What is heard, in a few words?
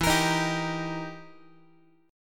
E Minor Major 7th Flat 5th E down Guitar; x,12,13,13,11,x; 4A,4E,5A,5D,5G#,6C#; strummed; JGuitar